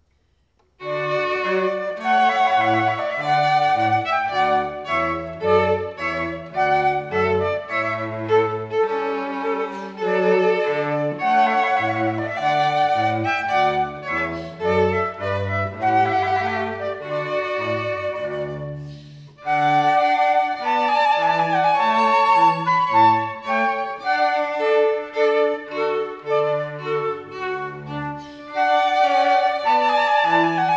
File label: trumpet voluntary, trio